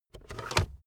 car backseat table fold in fast hard

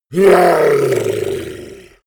yeti growl angry command; kreaturen

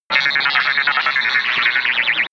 earth miserable radiofrequency connection

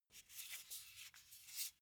car flip key handling long

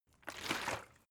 bottle water sparse upend soft; berufe

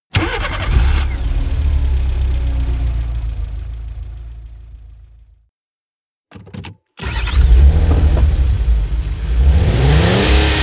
car car start

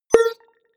sci-fi bleep; soundpool

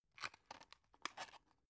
drug close soft; berufe; arzt

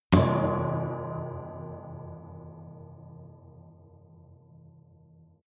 underwater metallic

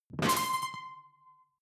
sci-fi alien console high; maschinen; signale; soundpool

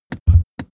car seat massage engine short

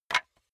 wood board small drop; objekte; holz

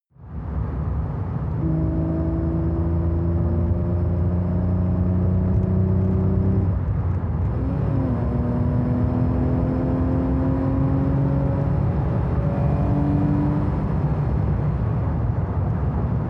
sports car int engine drive accelerate higher rpm; verkehr-transport

